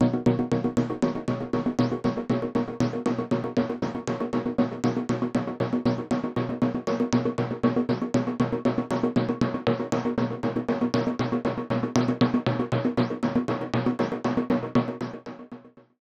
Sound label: Synth wet